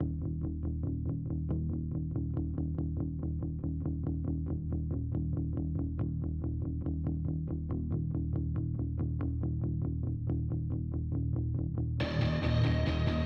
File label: Combat Boss Final Threat1 140 Ebm